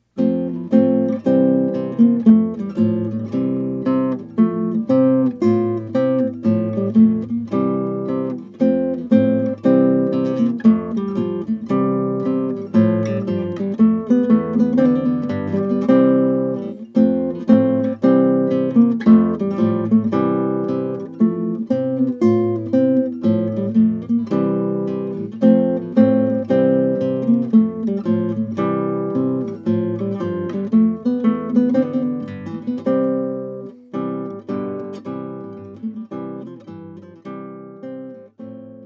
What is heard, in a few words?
Trumpet Tune; Clarke